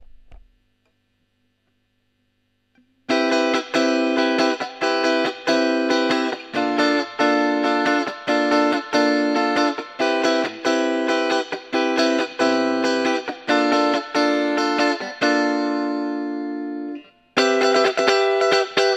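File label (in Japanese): クリーン, 真空管ギターアンプ; AR300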